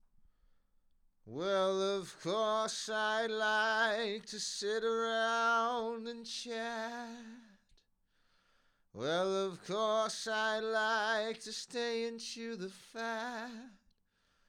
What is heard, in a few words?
sample sm58